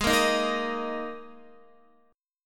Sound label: Ab Suspended 2nd Guitar; strummed; JGuitar